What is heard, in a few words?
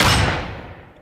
gunshot